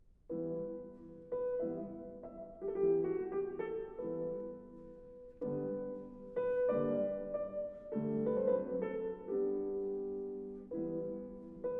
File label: Variations for flute & piano in E minor